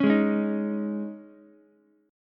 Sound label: C sharp Suspended 2nd, C sharp, Ukulele; C#sus2; strummed; JGuitar